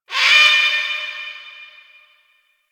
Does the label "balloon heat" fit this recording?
No